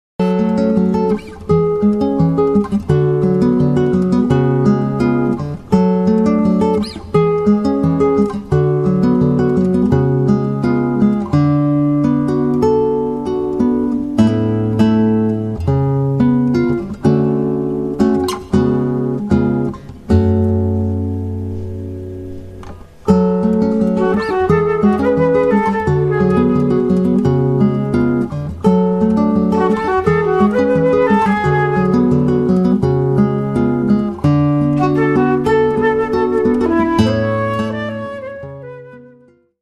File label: Flute & Guitar